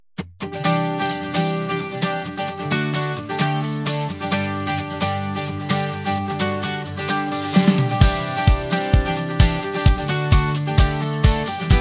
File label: pop